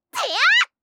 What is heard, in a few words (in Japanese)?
てやあ！(中攻撃); バトル系